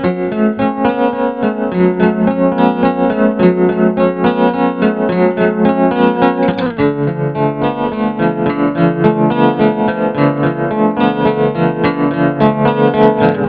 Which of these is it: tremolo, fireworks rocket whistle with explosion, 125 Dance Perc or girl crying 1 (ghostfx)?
tremolo